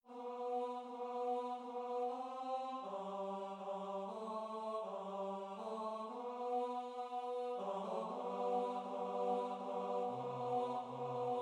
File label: 4 part Unaccompanied song for SATB or Mixed Voice Choir